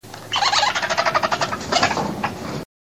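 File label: Egyptian vulture voice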